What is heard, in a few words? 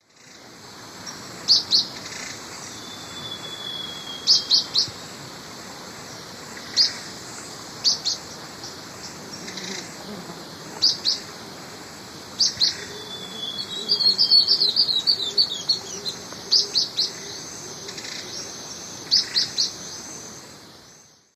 Hylophylax naevius, varzea, calls; S. America; Eastern Lowlands